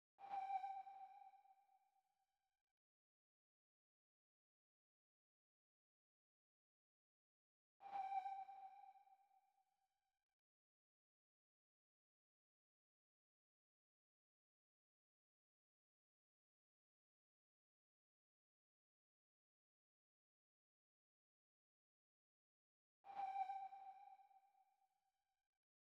126 BPM G Min Accent; Melody Loops; Loop Stems; Hip Hop; Cymatics; Cielo

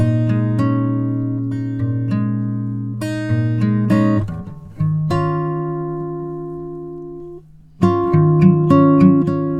Guitar Loops 100bpm Bb min; Guitars; Xmass; Ghosthack; Advent Calendar 2019

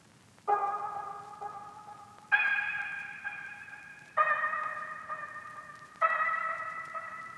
Various Melody Loop - 130 BPM C# Min Pluck; Melodies; Loop Stems; Cymatics; Oracle Sample Pack; Oracle Various Melody Loop 8

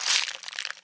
Crunch Shaker; Cymatics; PHARAOH Beta Pack; Pharaoh Premium Drum Samples (BETA)

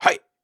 Grunts and Chants, Viking Vocal

